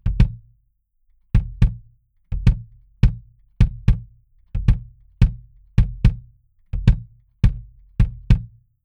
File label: bass drum kick d112